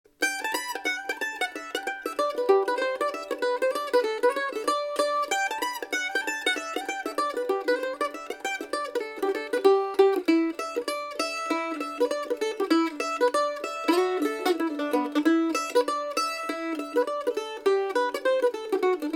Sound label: Mandolin